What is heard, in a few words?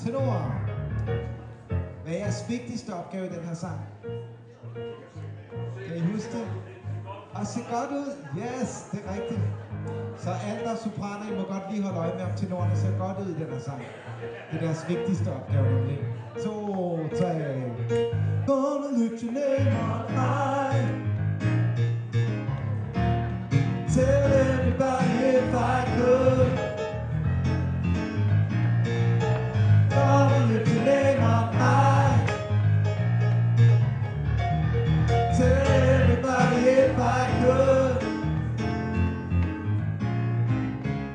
gonna lift your name on high tenor